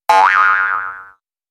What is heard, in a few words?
Message poing